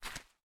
Step Sand; miside demo

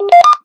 Cam ON

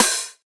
Hat House

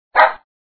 Bark; Raft Wars

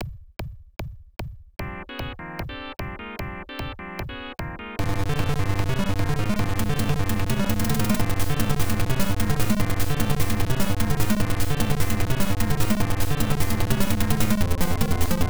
Round BGM; Logical; PC-98

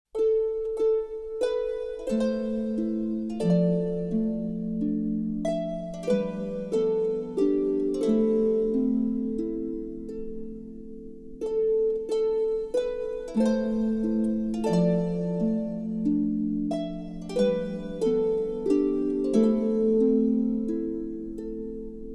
Main Theme (Harp); Sound of the Sky Crawlers; Affair

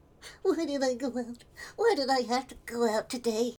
Male Idle

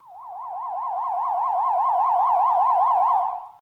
siren; Plants vs. Zombies

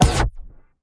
Bio Gun Shot

